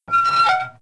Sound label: Door Metal Close; Playable Tutorial Level